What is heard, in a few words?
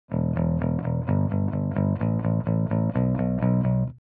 musicman bass